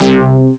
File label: acid bass; Amiga Soundtracker Sample Packs